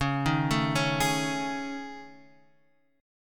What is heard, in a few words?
A Major 7th Flat 5th